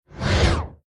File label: scenario start; Adventure Time - Break The Worm; ThrillGames